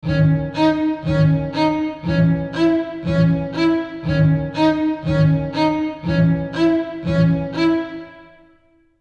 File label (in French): Rythme base